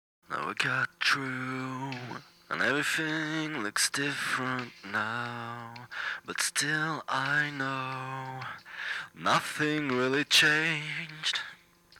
couplet voix

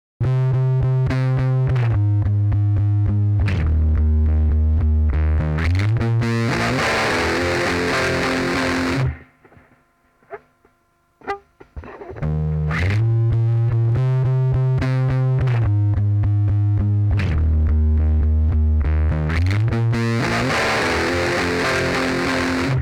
Guitare Basse

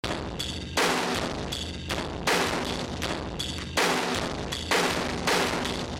batterie satu, metal

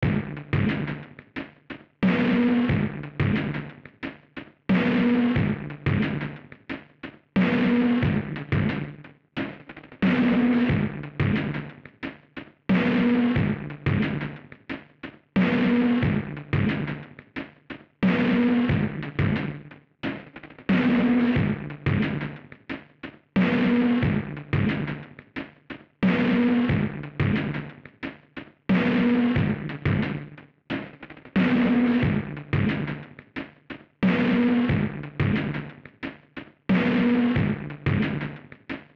batterie satu

